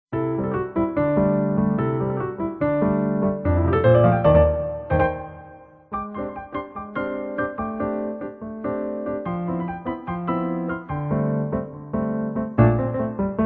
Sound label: battle